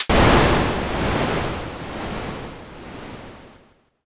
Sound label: thunder